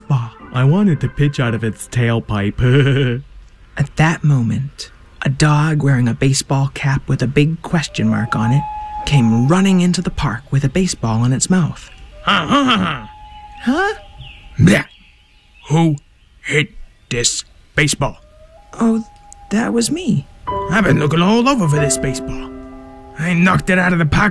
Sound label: Theme, Loons piano